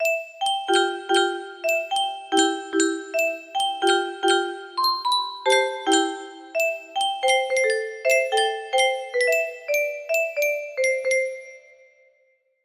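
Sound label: music box melody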